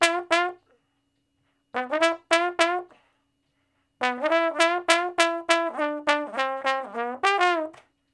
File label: Trombone Shuffle (G); Crimson Blue and Fabulous Horncraft 4 RnB; Horncraft for R&B; Solos